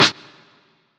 long snare drum a sharp key, steel snare drum sample tuned to the A# note